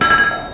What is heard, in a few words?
Metal Clank; SysError